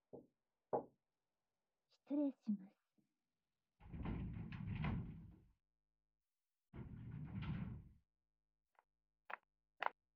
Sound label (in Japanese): フェラ 腔内射精。耳舐め 手コキ。; ASMR; SEあり